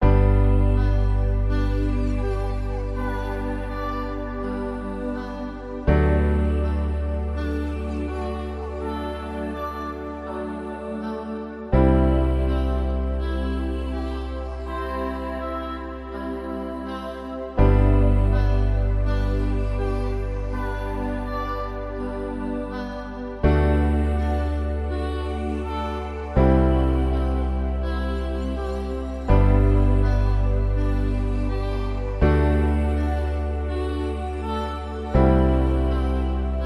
game music kids warm soft slow chilled piano bass warm pads vocal ahs; zapsplat